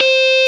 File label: GTR FUZZ; Dirty; Club-50 - Foundations; Paul-Stack